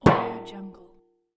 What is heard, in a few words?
metallic kitchen tray stack